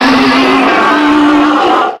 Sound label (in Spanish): Grito de Scolipede